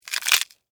Termite Bite, Animal, Termite, Bite, Hard, Crunch, Wood, Insect, Bug; Ant Sound Effects; BlastWave